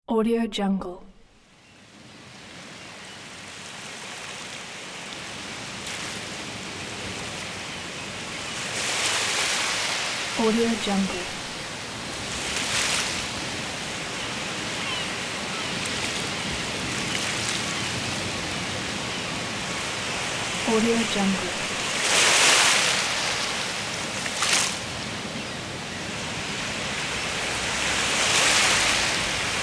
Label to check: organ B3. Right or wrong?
Wrong